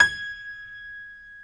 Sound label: Piano G#5; Proteus 2000; Digital Sound Factory Proteus 2000